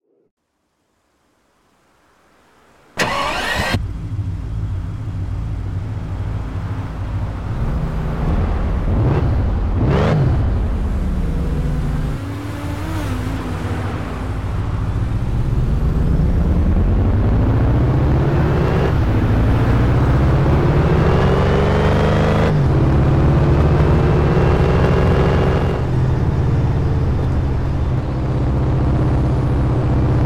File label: bugatti veyron 16-4